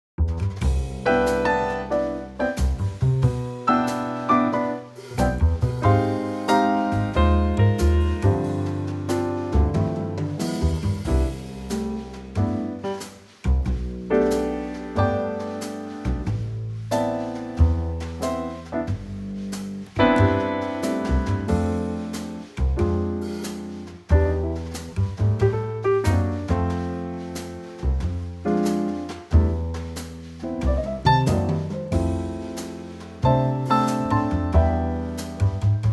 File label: Trio